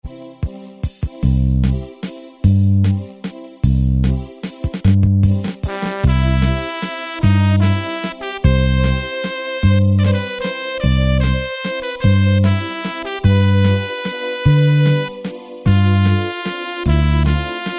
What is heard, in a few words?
waltz, Automatic Rhythms